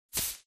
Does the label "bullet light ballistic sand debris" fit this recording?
Yes